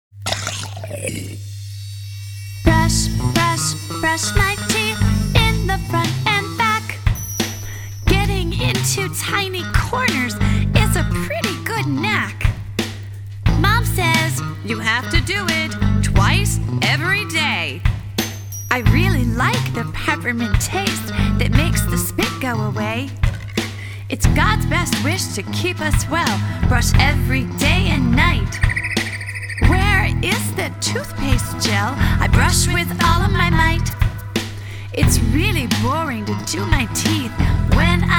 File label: Toothbrush Song